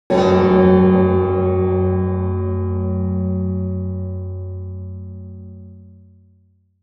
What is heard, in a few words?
cinematic church bell hit